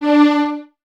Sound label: Violins Detache D 4; Miroslav Vitous; String Ensembles